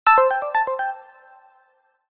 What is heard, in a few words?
Motion